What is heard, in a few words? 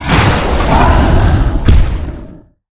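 flesh golem death, monster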